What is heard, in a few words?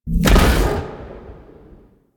Iron Blast Cast Low; Warframe; Warframes